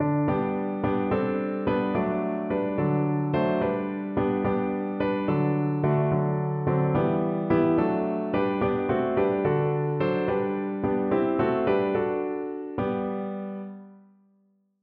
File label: three ships piano